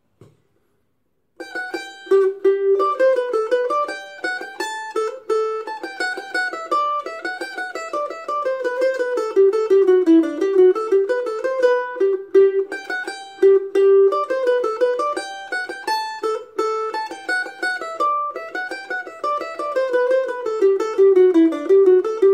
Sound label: Sailor's hornpipe